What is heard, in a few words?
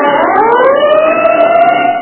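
police van sound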